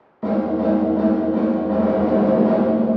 Plate Reverb HIGH CUT

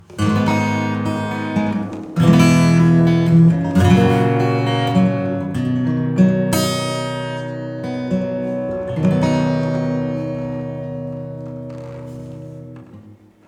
acoustic STEREO Adding Depth; GEM Echoson

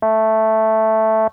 Nord Lead 4 sine FM in tri

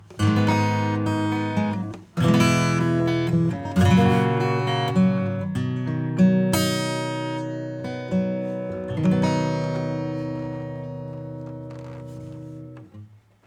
acoustic; Summit Audio EQF-100

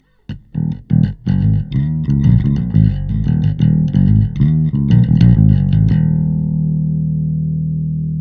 bass Bass Pick; Nanopulse

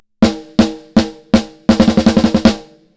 snare; Lindell 7X-500

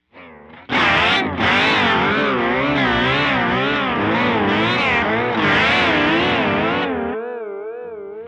Vibrato; Moogerfooger MF-108S

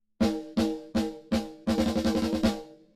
snare TONAL TRANSIENT Separation 0; SplitEQ

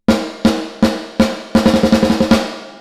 Reverb Snare

